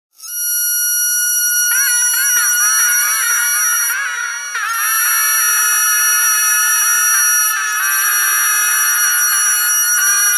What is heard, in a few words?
bagpipe geese bagpipe thread noise